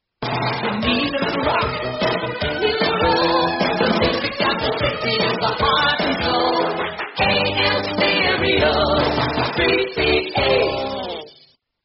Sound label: jingle; australian radio 3ba